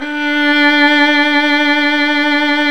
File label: STR VL D 5, STRINGS; Roland - LA Composer vol. 1